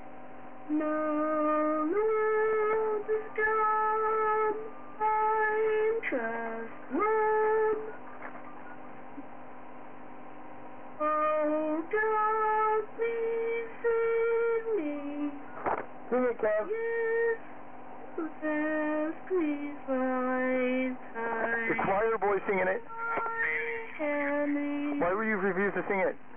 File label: sing